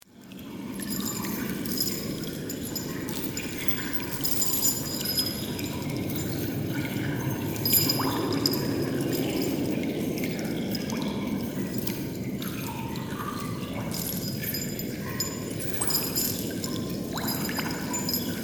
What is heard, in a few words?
Dungeon Chains, Dungeon, Chains, Wind, Water, Drips, Horror; 5.1; BlastWave